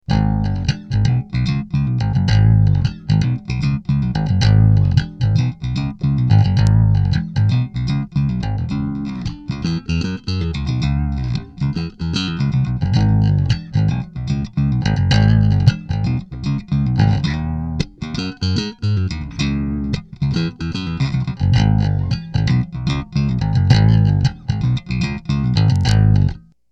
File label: riff